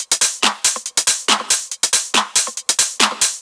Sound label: drum Loop 140BPM Perc